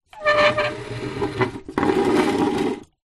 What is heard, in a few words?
household chair small wooden drag scrape squeak hard ground